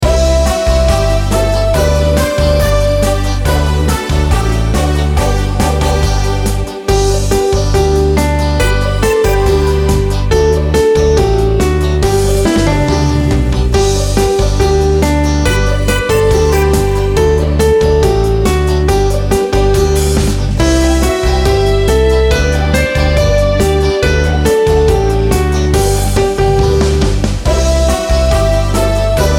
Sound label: karaoke